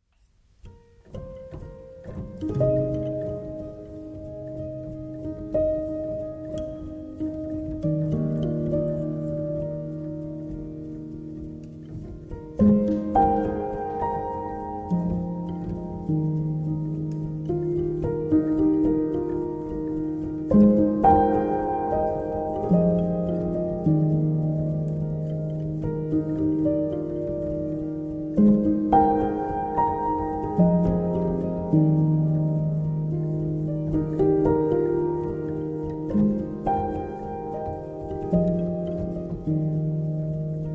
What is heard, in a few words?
Piano